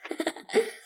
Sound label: giggles and laughter; SyrinScape